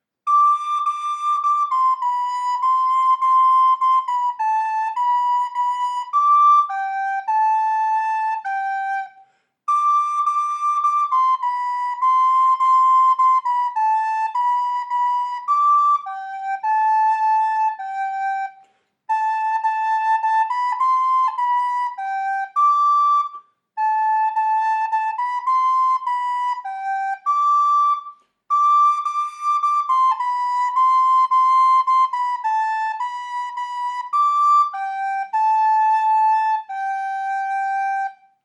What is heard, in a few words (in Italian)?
Ritmo di danza, flauto; brani flauto per cominciare